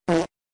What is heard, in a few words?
quick fart sound